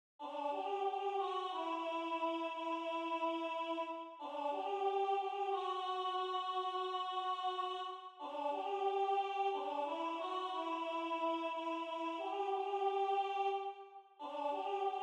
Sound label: alto